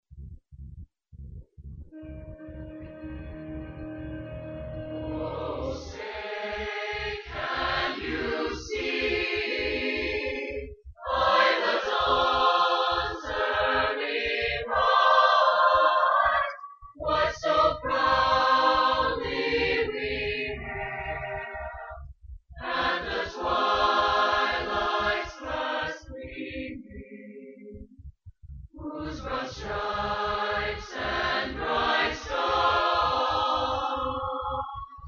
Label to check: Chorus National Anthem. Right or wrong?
Right